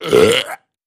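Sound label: Large Abrupt Nasty Belch, belching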